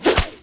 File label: ARROW